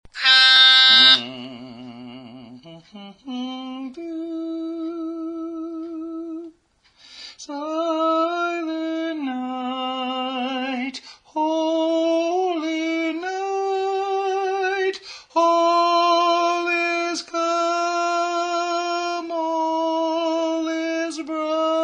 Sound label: Tenor; Silent Night